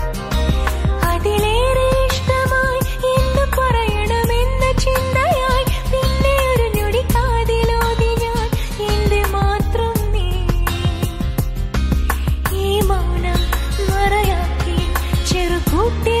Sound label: Malayalam Song